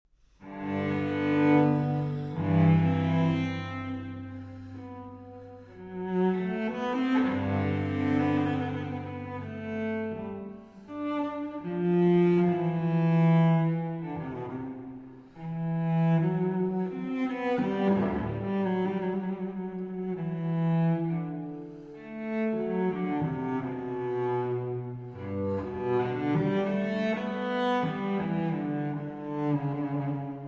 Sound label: Cello Suite in G Major; J.S. Bach; Cello Suite No. 1; BWV 1007